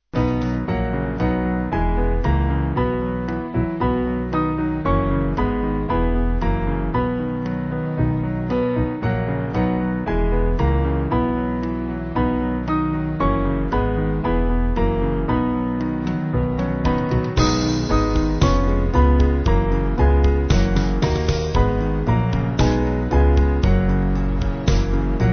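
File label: band, Music Backing Files for Churches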